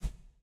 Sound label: Photo General Focus-Down